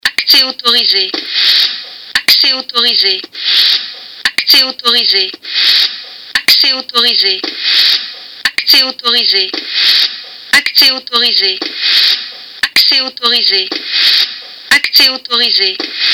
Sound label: Vocal